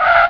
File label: LAND